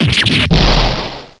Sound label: fan